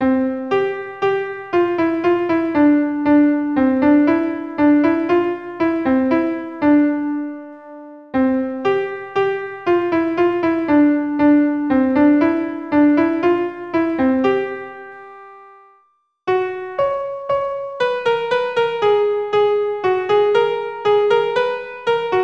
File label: melody